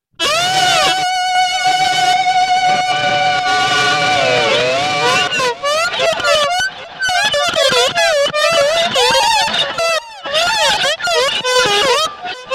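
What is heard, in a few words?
guitar, electric guitar solo; impro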